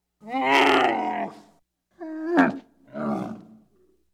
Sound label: angry camel